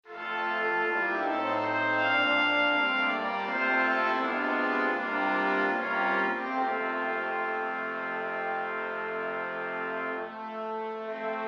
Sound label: Brass Quintet; Australian UpCountry Song